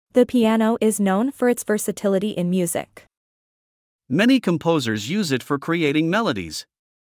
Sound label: Piano